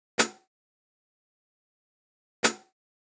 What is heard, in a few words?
Hi Hat; hydrogen